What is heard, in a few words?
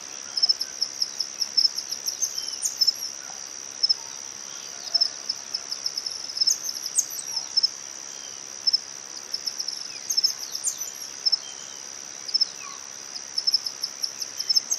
White throated kingbird